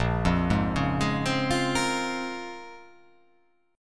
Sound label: A sharp Minor 9th, A sharp, down; arpeggiated